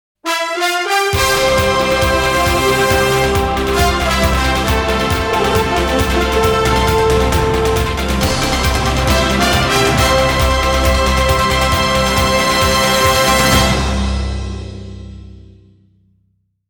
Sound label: brass bang